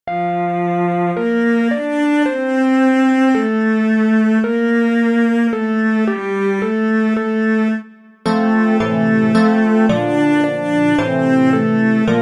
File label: annual motto keyb